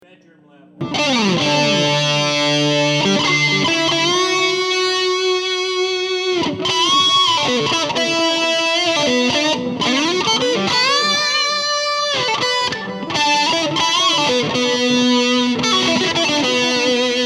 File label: Volume Civil War Muff bedroom half full